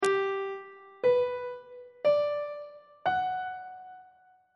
g flat phrygian 7th chord 2 is a double flat major 7th; on treble clef